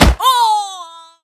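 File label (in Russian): радостный ликующий короткий крик от попадания битой по мячу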